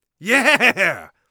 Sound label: joyous, Warrior Voice; Warrior Voice Pack